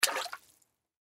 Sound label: Splash Bathtub, Water Splash Bathtub Small Hand Movement; Water Splash Bathtub Small Hand Movement Submerge; Bathroom; Blastwave